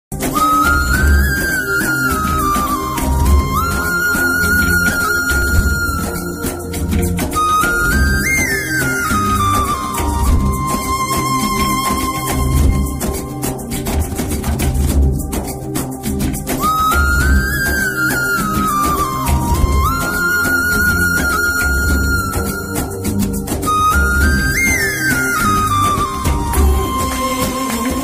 flute ringtone instrumental ringtone love ringtone